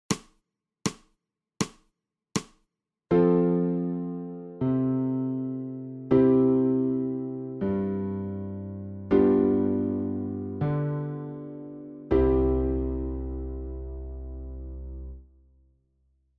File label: ALTO